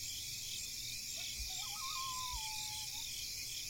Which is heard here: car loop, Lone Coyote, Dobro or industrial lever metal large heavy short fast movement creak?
Lone Coyote